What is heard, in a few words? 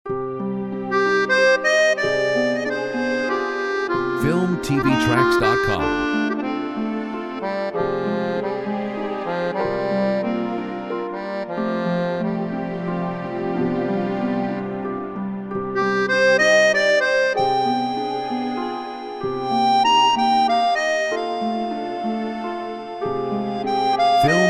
Accordeon Romantique